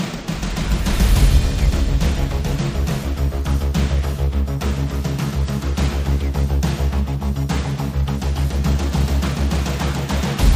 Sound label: Drums & Bass Only